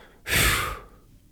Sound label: Relief Breathing